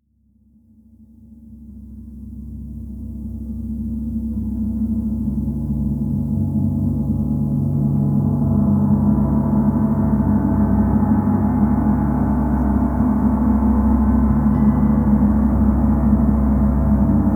seabirds instrumental

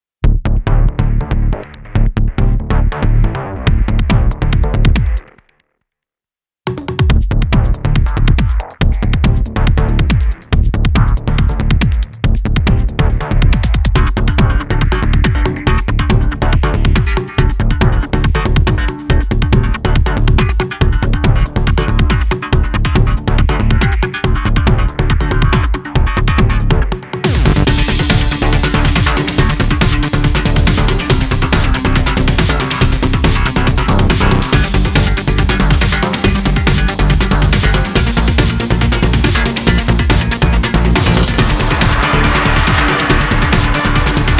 synth only

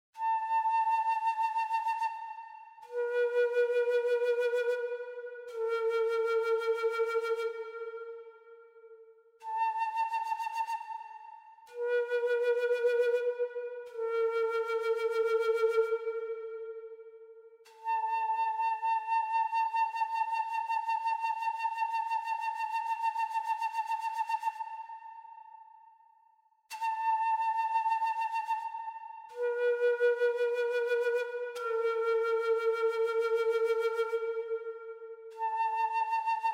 native american flute

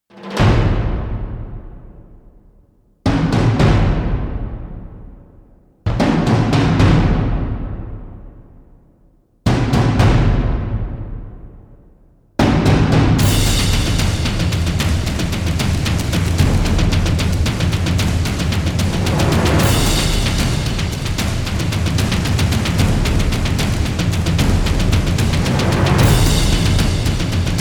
drums

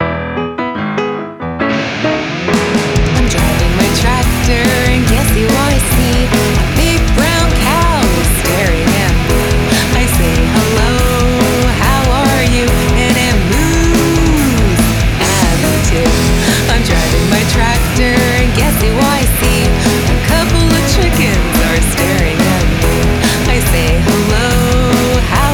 driving my tractor farm animal sounds song cover

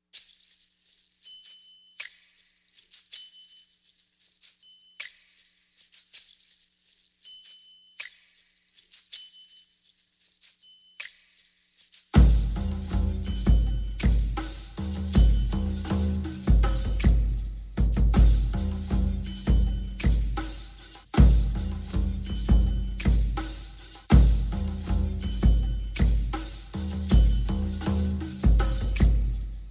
perc only

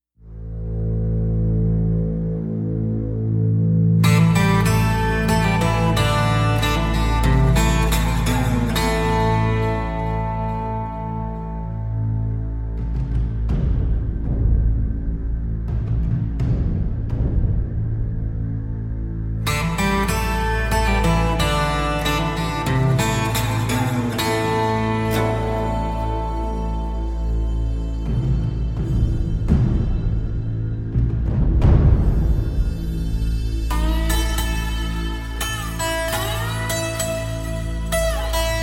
bed dustbowl rattle a minor

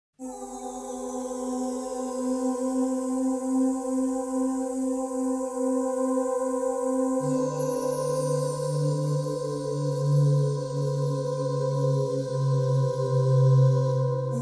trance intro

